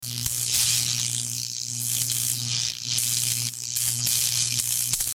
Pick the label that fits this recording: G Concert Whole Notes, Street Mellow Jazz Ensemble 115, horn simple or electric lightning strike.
electric lightning strike